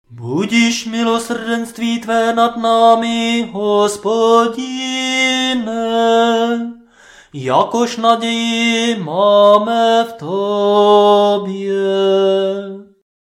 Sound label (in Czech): Nedelni prokimen hlasu